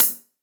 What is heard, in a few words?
Acousti Hat Hit, hihat; ultimate hihat; Hits